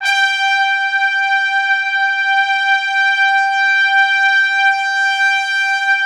G4, Orchestral Brass; Best Service ProSamples vol.20; AKAI

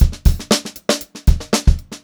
GROOVY BEAT, Funk Groove Drumloops

